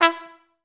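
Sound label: staccato E3; Best Service; Peter Siedlaczek's Advanced Orchestra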